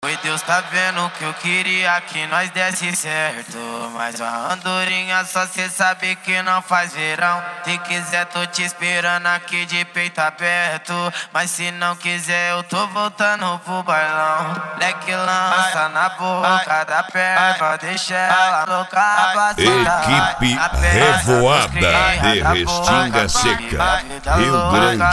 eletrofunk; cd equipe revoada especial fim de ano

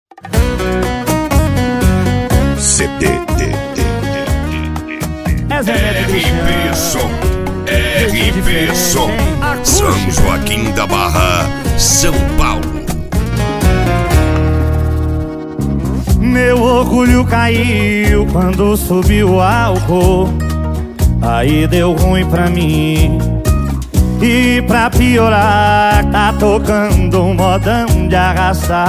sertanejo universitario

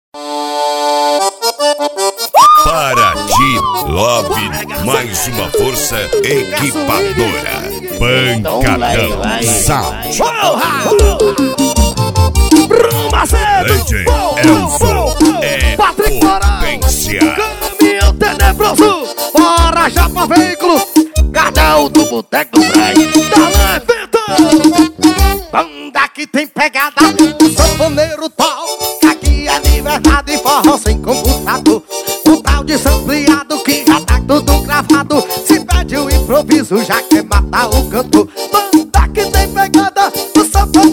forró sampleado; cd parati love as top

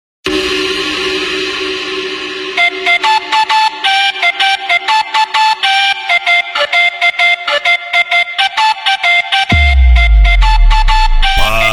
melodia iraquiana da flauta; cd paredao madruga vol 04 funk mandelao

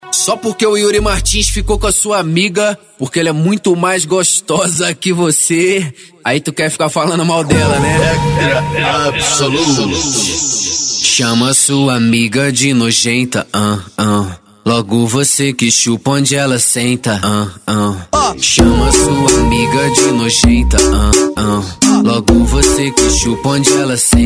especial funk

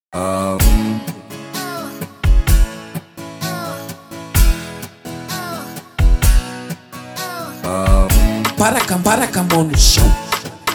eletrofunk

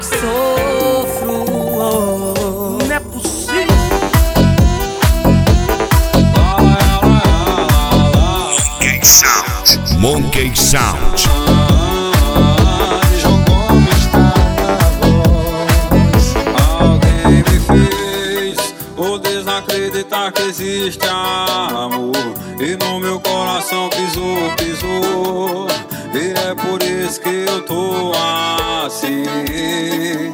esp balada

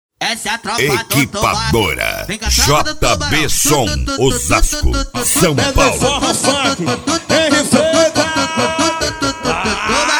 medley forro funk; cd equipadora jb som vol 15

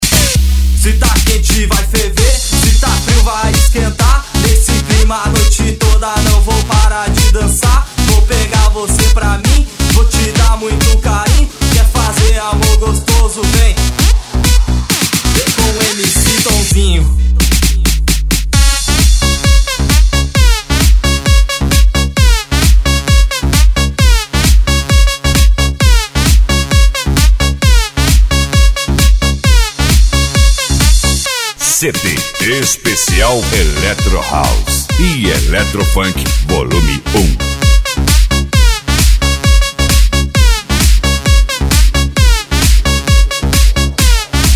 eletro house e eletro funk

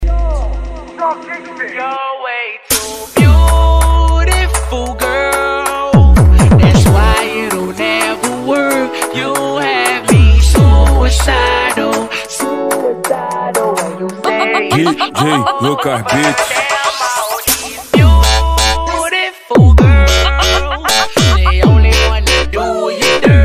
sound car funk; street

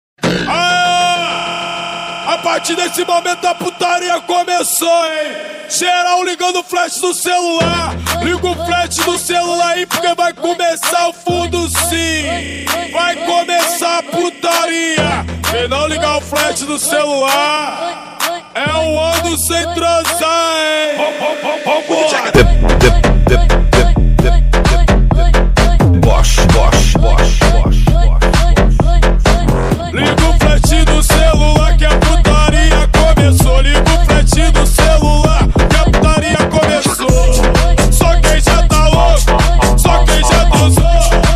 eletro funk; balada g4

